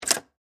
Electric Typewriter, Type, Single, Key, Swintec, 8014-S; Technology; Typing